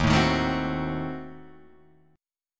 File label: D sharp Diminished 7th D sharp, Guitar, Open G